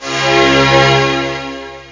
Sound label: bonus